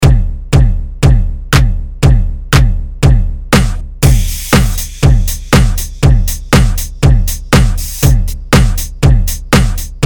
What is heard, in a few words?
Voice Synth